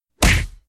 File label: card punch